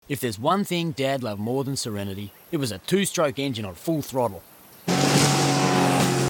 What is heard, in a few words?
2 stroke engine on full throttle